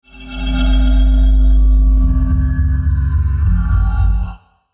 Subterranean Rhythm, 79 bpm

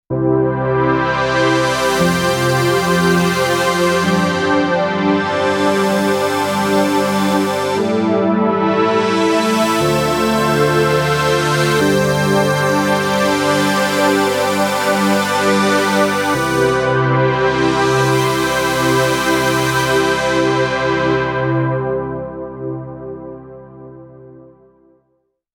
modal electronics cobalt5s

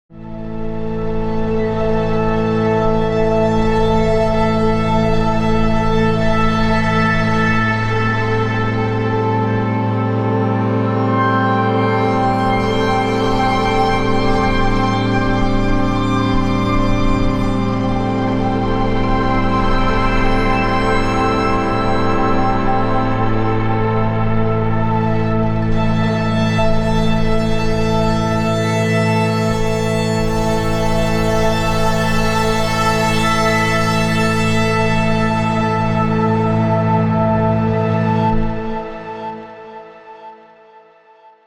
arturia pigments

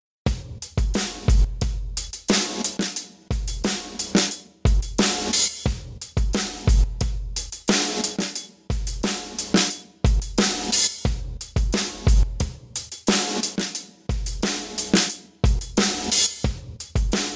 rev fx sidechain elysia mpressor

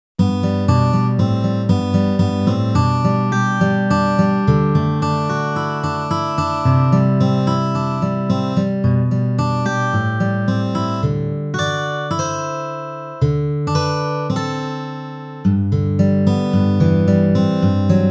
kawai ca501 ballad guitar

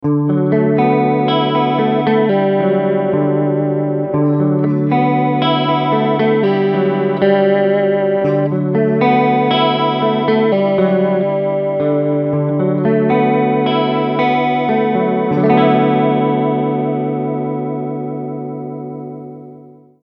mxr analog chorus

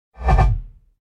whoosh clean

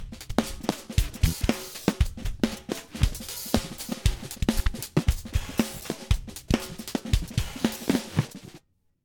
eventide h3000 factory devine shuffle

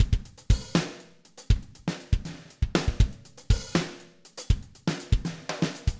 felt beater sticks, drummer; toontrack superior drummer

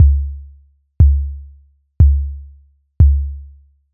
bass drum kick; ableton live

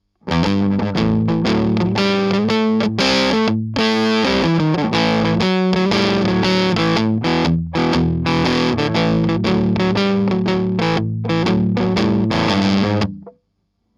germanium drive smooth highs full boost; Chandler Limited

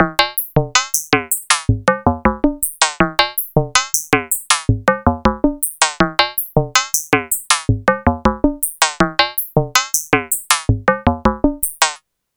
ladder filter reso audio modulation 80 bpm; arturia matrixbrute

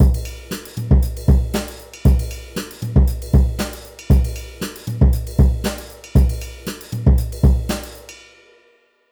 Alesis SamplePad Pro

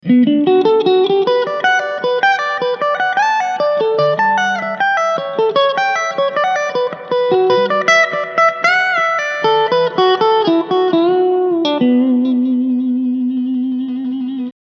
vox ac10c1 clean picking

